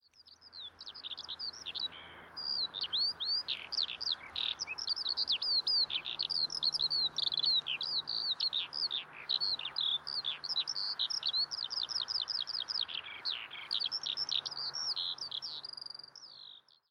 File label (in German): Feldlerche, Vogelstimmen; Landschaftspark